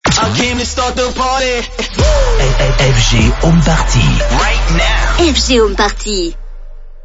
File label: jingle; fghomeparty